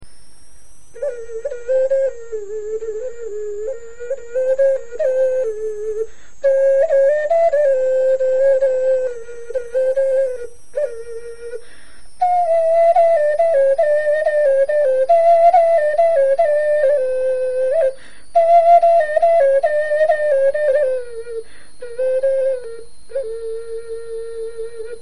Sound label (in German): melodie, knochenfloeten